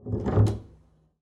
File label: pizz noise strum loud, double bass; dsmolken